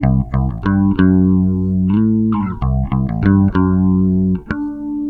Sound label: STRANG 94 C, Bass Grooves; USB Soundscan vol.30; AKAI